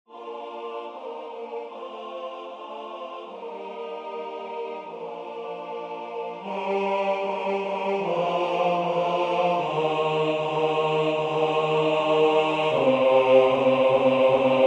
O vrai Corps de Jesus basse; Du Sejour

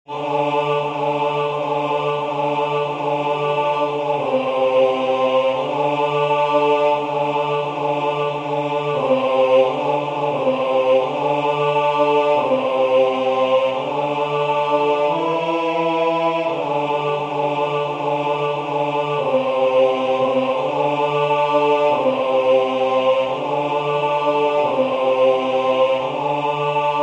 voix II basse; Jesu dulcis Mater

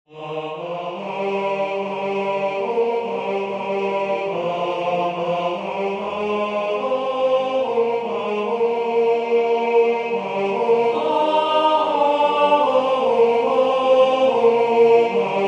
homme, Musique sacrée; Depuis l'aube; Liebard

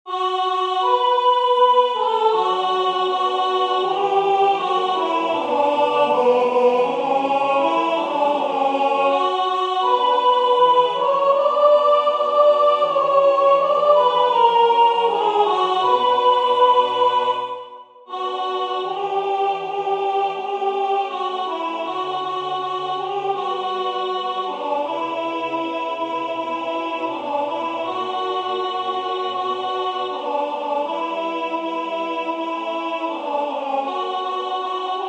Maintenant Seigneur soprane

